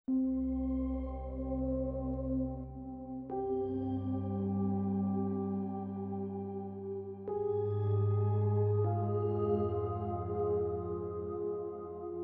Music Loop; LostCrypt Tutorial

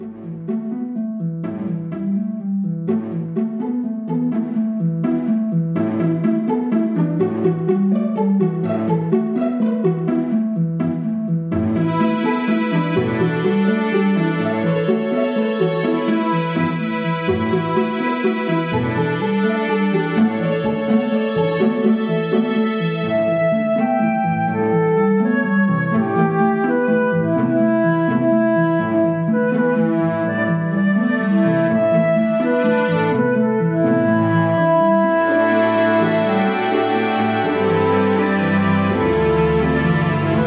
background music; UIToolkit Unity Royale Runtime Demo

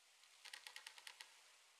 wood strain, Night; FontainebleauDemo

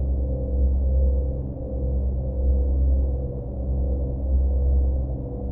Cars Hover Bus; Megacity

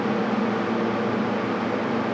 Aircon; Megacity

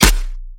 Futuristic Assault Rifle Single Shot; ML-Agents; FPS Game

